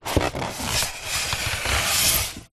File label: polystyrene blocks inside cardboard box movement; zapsplat; foley